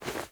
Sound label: Movement Foley; Warframe; Wisp